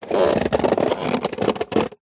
impacts metal small tin like hollow bend pressure creak